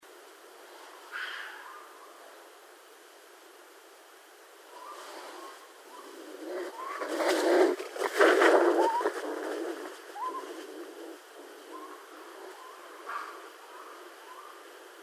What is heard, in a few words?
Raccoon; Northern Raccoon (Procyon lotor)